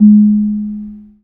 KENONG; Spectrasonics; Heart of Asia

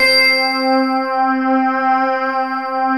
synth bell; VFXSD

